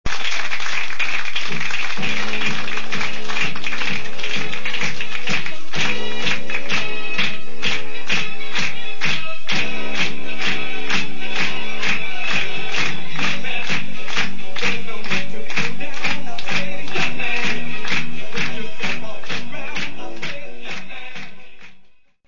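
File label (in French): ambiance spectacle people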